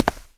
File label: Footstep Generic; Guard